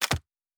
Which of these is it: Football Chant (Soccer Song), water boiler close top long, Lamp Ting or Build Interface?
Build Interface